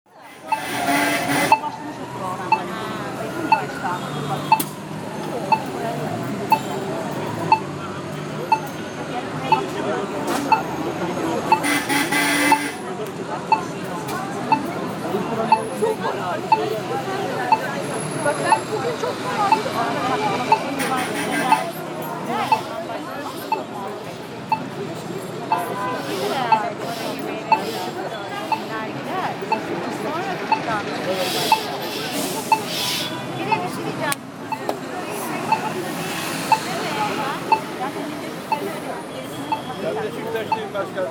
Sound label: besiktas traffic soundscape